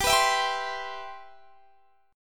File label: C Major 7th, G, guitar chord